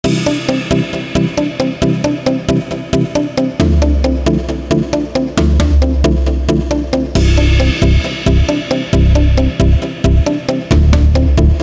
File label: Happy song with accordion; Mantra Studio